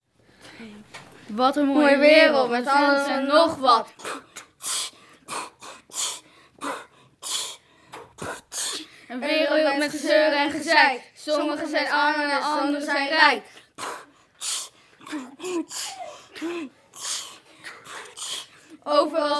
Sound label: rap